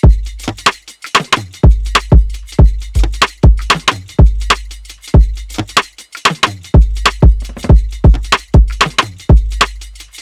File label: Shout Drum Loop; Kount Drums Vol 5